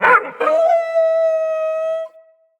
Wolf Cub Trapped Call Far; Vocals; Animals

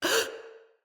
Pain Female; Battle Barks; Envoy

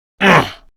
Pain, Male; Warframe; Grineer Male; Battle Barks

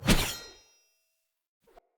Short Sword Whoosh Heavy E; Weapons; Enemy; Swords; SinecureShortSword

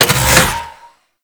Cannon Charge; Warframe; Weapons; Archwing

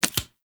Tarot UI Card Click; Warframe; Harrow; Tarot Emotion Choice

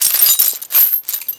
Chain Fall, Dojo; Ambience; Warframe; Mod Quest; Teshin's Hideout

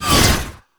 Whip Swipe; Warframe; Melee; Dagath Blade And Whip

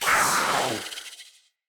Kavat Vocal Attack Medium; Warframes; Khora

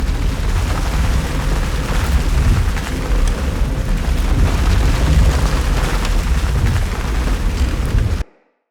Root Large Loop A; Neathuns

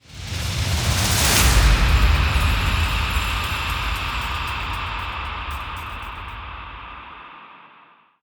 Fishing Success Small; Warframe; Deimos

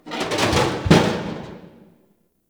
Destruction Metal Falling; Ambience; Warframe; Corpus Railjack

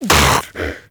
predator vocal tranq dart grunt; Warframe; Hunting Critters; Characters

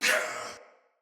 Pain, Barks Battle Soldier; Sinecure Soldier

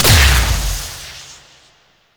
Fire Medium, Weapons; Warframe; Duviri; Incarnon Adapters

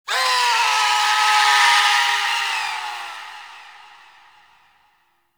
Boss Crystal Spirit Release; Weave Boss

